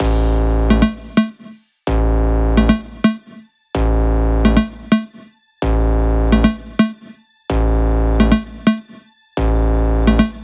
128BPM Fat Long A, Melody Fills; 32Bar; Vengeance Samples

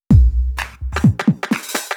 Fill 128 BPM; Vengeance Samples; VEH1 Loops

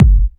Kicks; Vengeance Samples

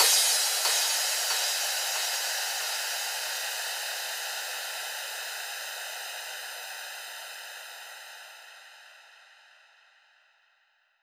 138 BPM Crash Long; Drums & Perc; Bminor

